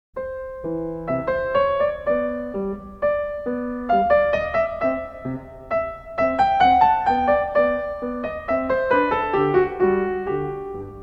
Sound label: Menuet In C Major; Magrath Book 04